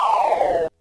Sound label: Bomber Hurt; Sid Meier's Civilization 4 Complete; Beyond the Sword; Final Frontier; Units